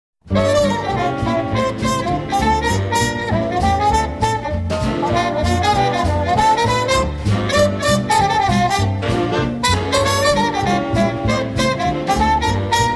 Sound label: Orchestra